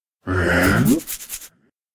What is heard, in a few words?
Confused Giant Skeleton